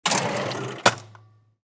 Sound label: Cash register drawer open